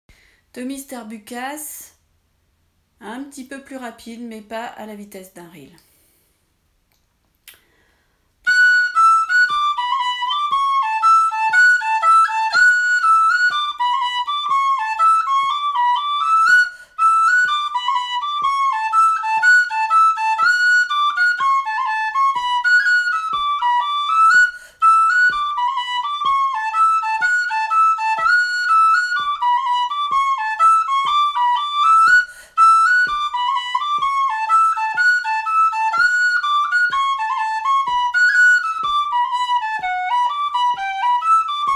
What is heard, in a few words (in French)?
mi lent; Tommys Tarbukas